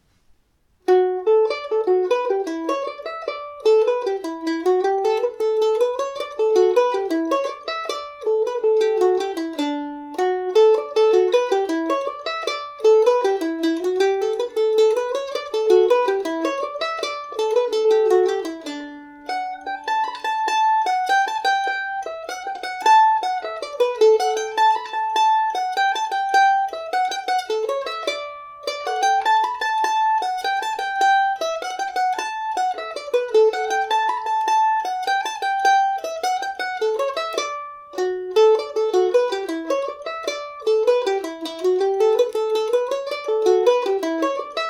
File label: jig dmaj, mandolin; Kentucky KM1000 mandolin